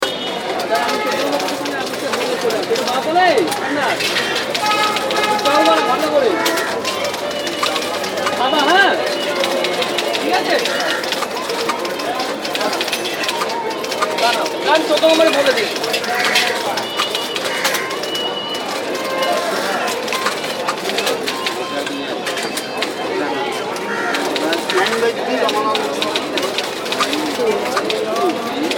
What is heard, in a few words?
Calcutta Typing